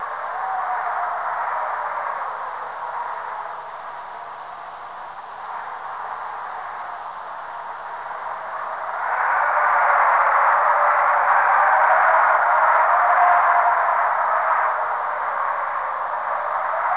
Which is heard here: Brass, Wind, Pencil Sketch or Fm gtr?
Wind